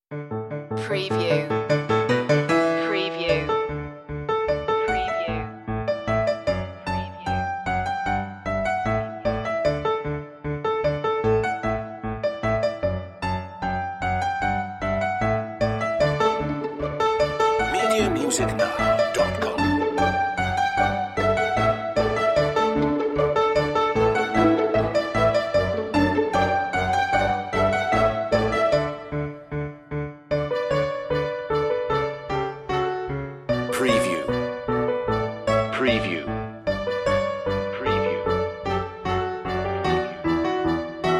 no strings

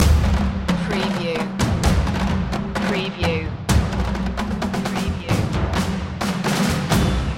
storming percussion loop

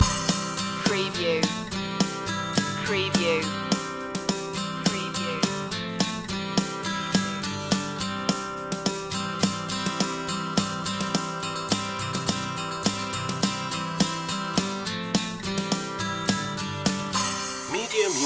old macdonald had a farm loop

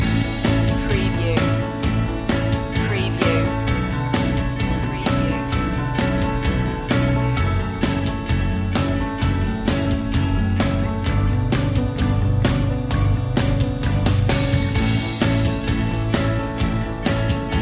easy living loop

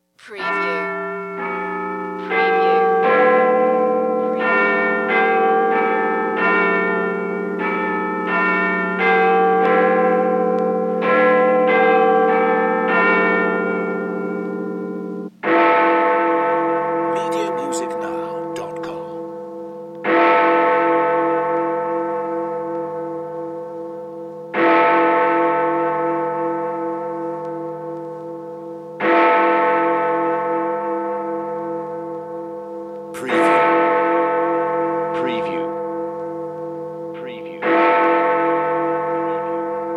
Big Ben Strikes Noon

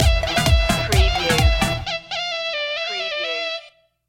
oriental dance stinger

